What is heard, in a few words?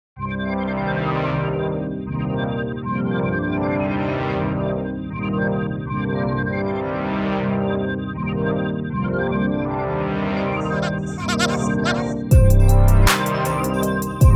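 BPM158 KEY C minor, dark trap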